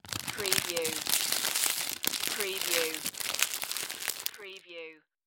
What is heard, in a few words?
Bag Plastic Roll Close; Foley; sound effects bible